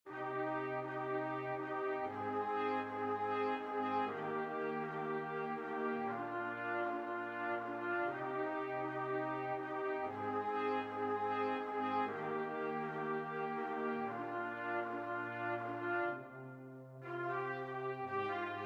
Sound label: Brass